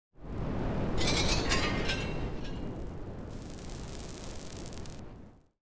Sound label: crunch zones 3D